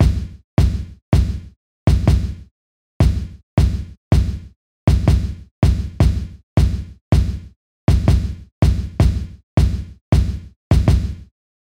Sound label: kick example